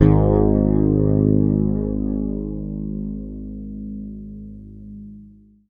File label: G#, Bass; Sampleheads; Will Lee Bass Library